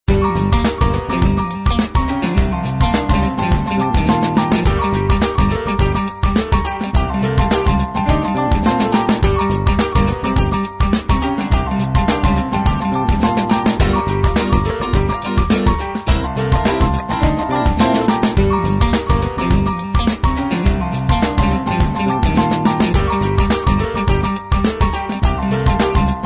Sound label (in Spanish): pop sintonia